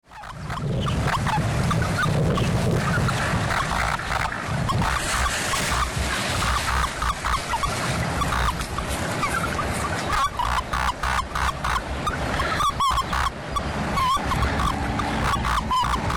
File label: viridis males